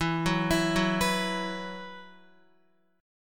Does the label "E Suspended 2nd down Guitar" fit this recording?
Yes